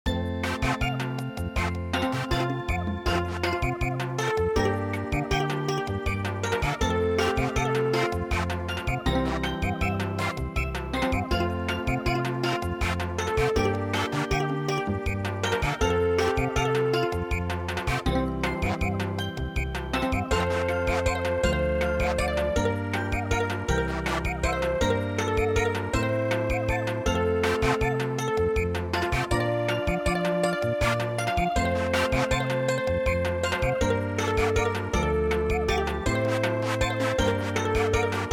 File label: Samba